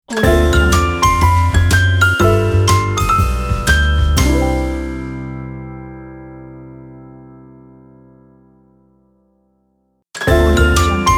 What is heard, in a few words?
jazz logo intro pack